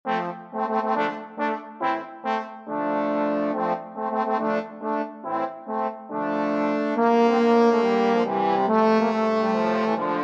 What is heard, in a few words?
trombone quartet